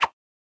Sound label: Spawnin Whoosh; Boomerang Fu